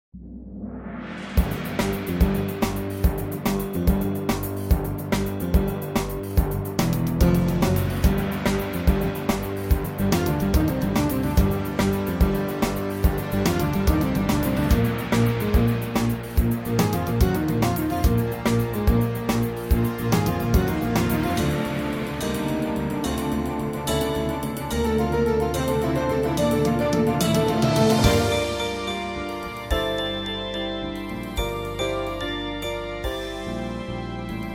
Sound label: Airport! (Backing Track)